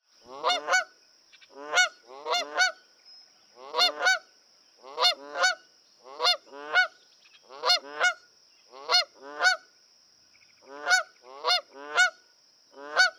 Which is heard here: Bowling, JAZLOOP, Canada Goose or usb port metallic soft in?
Canada Goose